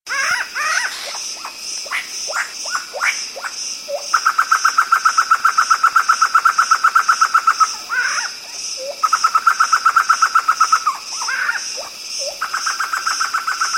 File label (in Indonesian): poksay hitam song